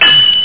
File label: Sword; eldritch